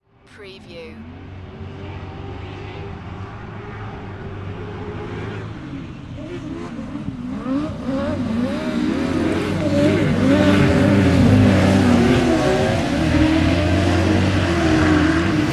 Car racing on grass distant perspective with light aircraft flyover at end; beanstalk audio